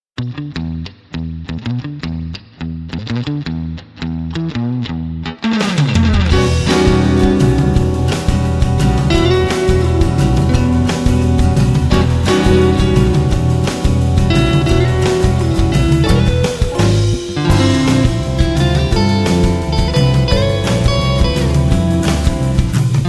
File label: Jet Set Guitars